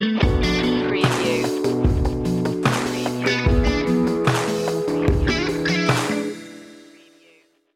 give a little stinger; swagger

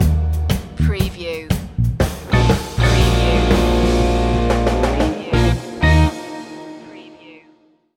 stinger, organ

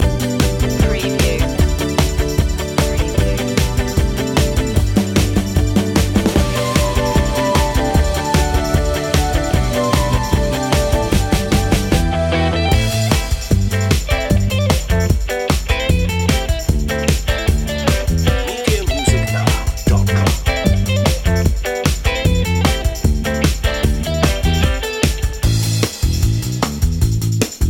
loop; pop-rock

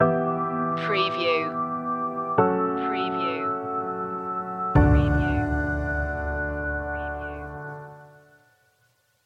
will it rain stinger; pop

